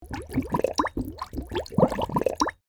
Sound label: Cartoon bubbles